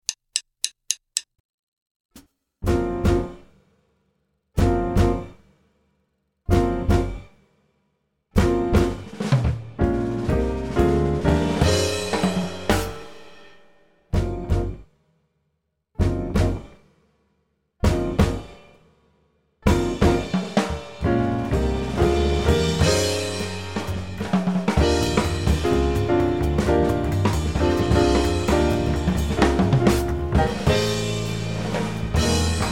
minus melody

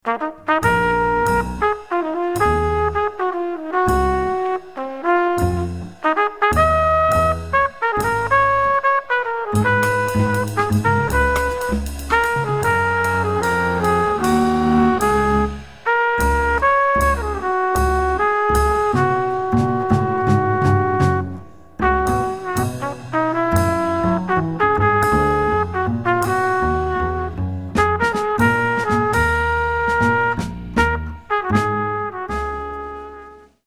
minus piano; trumpet starts the melody

